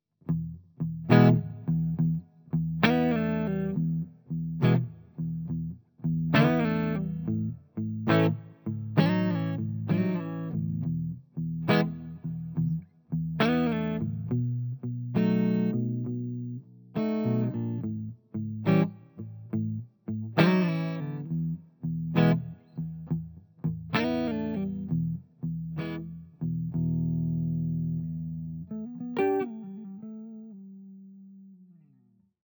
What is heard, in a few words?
neck clean, gibson sg; sg goddess